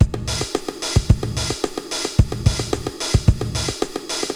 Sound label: 80s Gated Verb Korg MR-16 (110BPM), cyborg beats